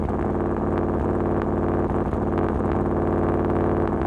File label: hotrod, warthog, vehicles, loop, med